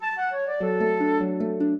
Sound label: minuet, flute harp; Mozart